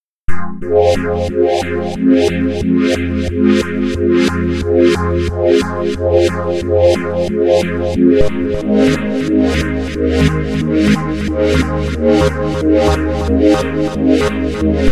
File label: hold chord seq